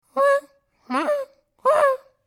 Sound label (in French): chaise métal tiré assis dessus; Mobilier